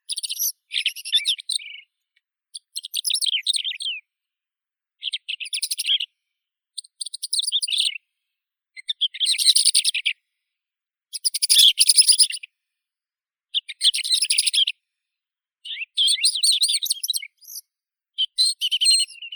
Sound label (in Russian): zhavoronok malii calandrella brachydactyla, zvuki prirodi